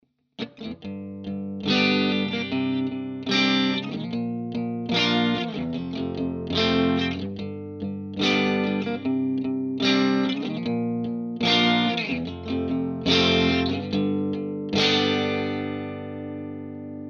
Vovox Sonorus Protect A350 TS-TS test strat vol full JTM45